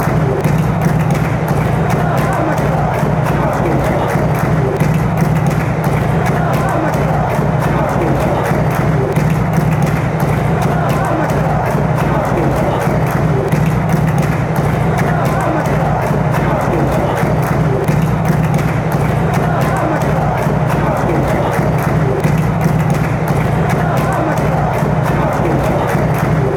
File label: claps